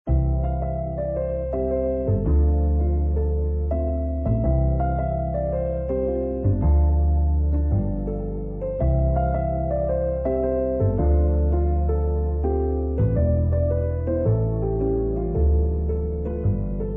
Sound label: music Kitchen